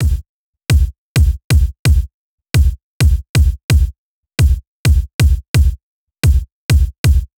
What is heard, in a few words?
kick